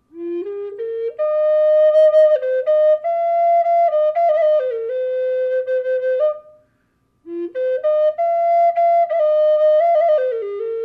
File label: ironwood flute buffalo yellow cedar; collector flutes